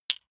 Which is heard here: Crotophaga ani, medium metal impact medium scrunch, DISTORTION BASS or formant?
medium metal impact medium scrunch